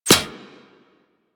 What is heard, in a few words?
Arrow Impact Metal; Warframe; Weapons